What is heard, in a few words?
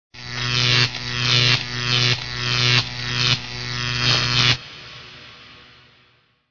high voltage ringtone